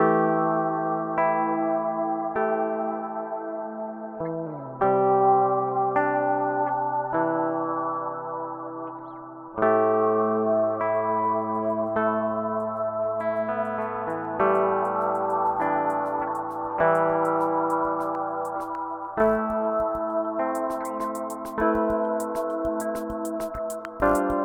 Strymon NightSky

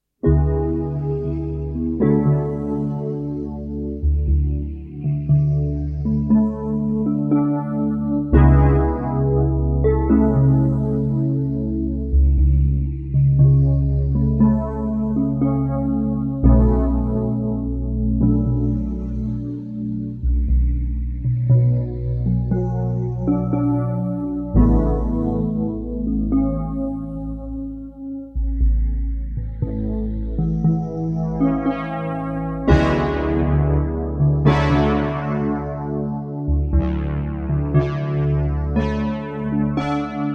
Yamaha SY77 Preset

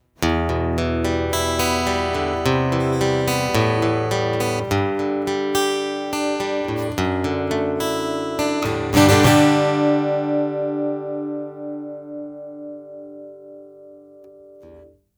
Carl Martin Acoustic Gig EQ

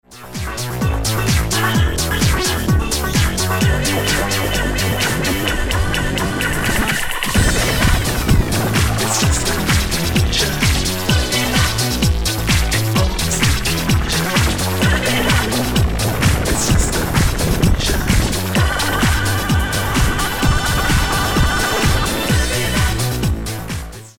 Xone92 Allpass Reso und LFO

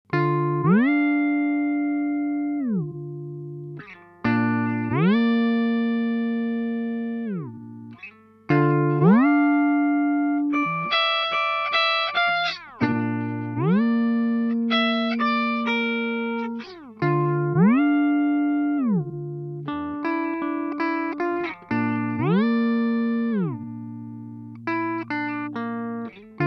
EHX Pico Pitch Fork Octave up u Clean

